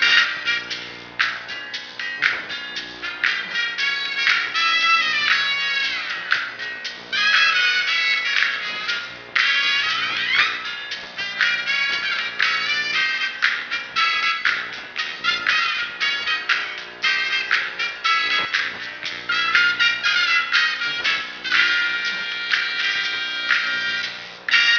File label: cuban music; de desert city